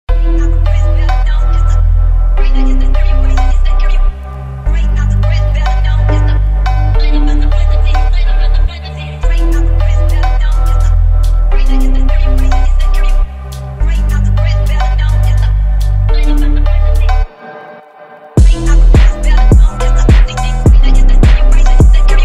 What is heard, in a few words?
phonk house remix